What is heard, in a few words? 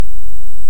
Hydrophone; OneOcean